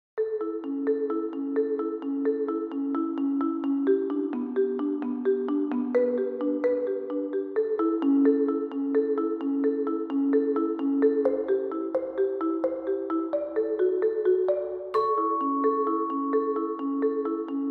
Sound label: Mallets